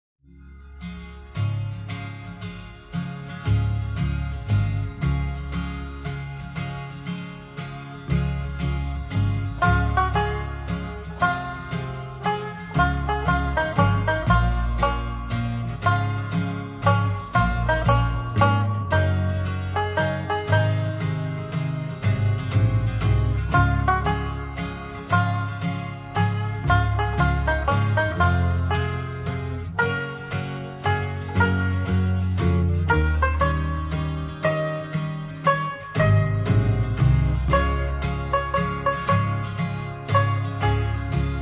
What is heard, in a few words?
Banjo